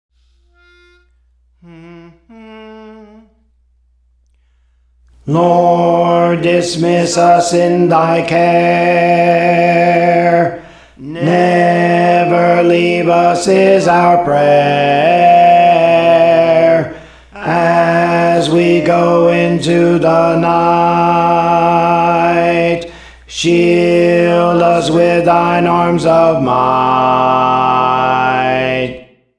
Alto; Praise For The Lord Training; Lord Dismiss Us in Thy Care

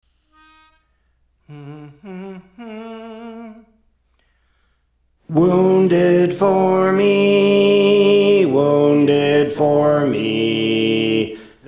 Alto; Praise For The Lord Training

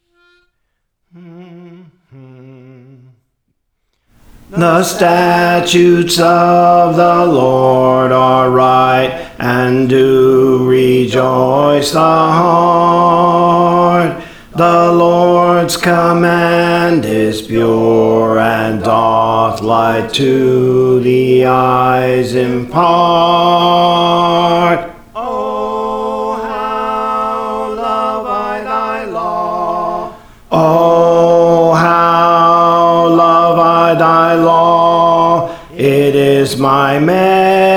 Bass, Songs; Praise For The Lord Training

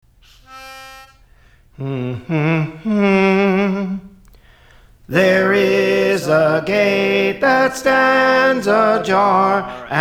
Soprano; Praise For The Lord Training

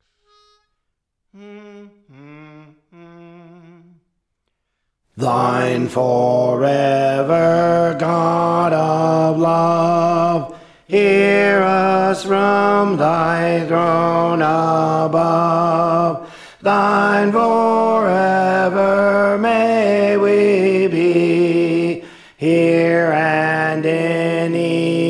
Alto; Thine Forever; Praise For The Lord Training

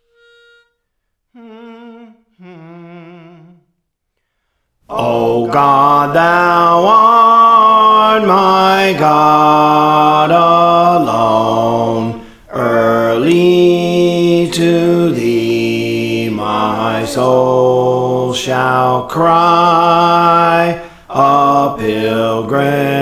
Bass, Songs; Praise For The Lord Training

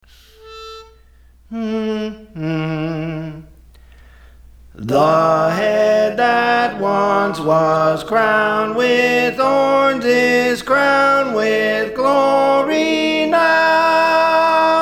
Soprano; The Head That Once Was Crowned; Praise For The Lord Training

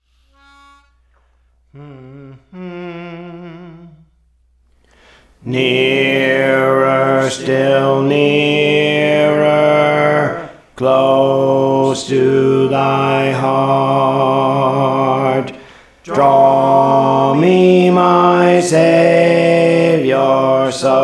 Alto, Songs; Praise For The Lord Training; Nearer Still Nearer

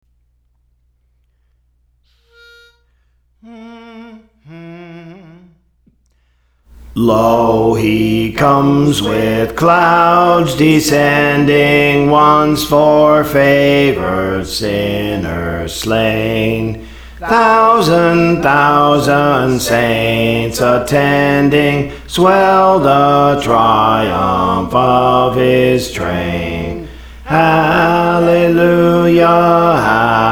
Bass; Praise For The Lord Training; Lo He Comes with Clouds Descending

